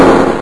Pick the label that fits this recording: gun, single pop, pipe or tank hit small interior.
gun